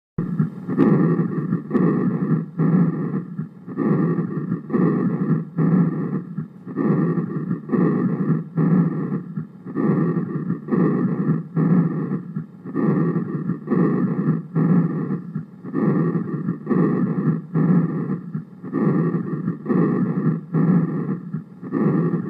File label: Cavernous breathing; simulatorvzorky